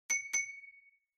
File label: Smartphone UI Sound Dry